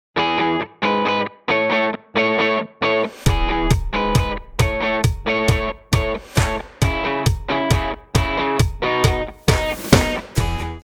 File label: Pop